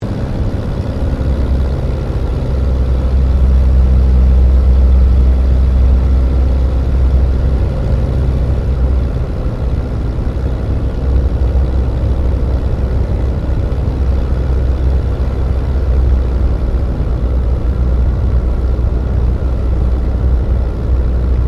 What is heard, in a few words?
AIRCRAFT DH82A DEHAVILAND TIGERMOTH TOUCH AND GO INTERIOR LOOP; RAAF Tigermoth; Airplanes (Sound Effects:Vehicles)